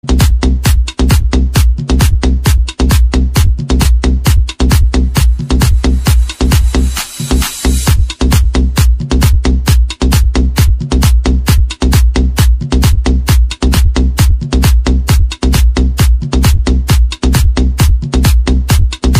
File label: romantic ringtone